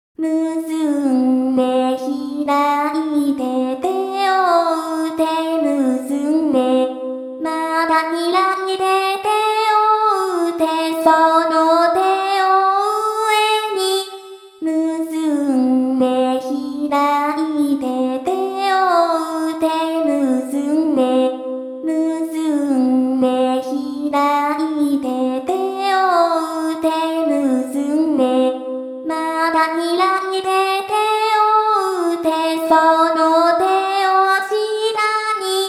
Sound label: Hush my Babe voice